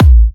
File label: Kicks A; markus hakala